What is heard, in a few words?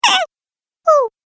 Cartoon Game Voice Baby Sounds; Cartoon Voice Sound Effects